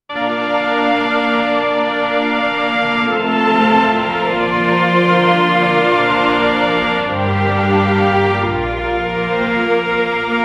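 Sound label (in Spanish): música período neolítico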